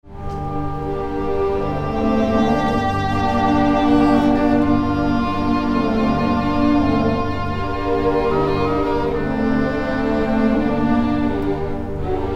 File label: Arabian Dance